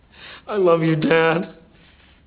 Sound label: light saber